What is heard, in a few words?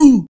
hurt, creature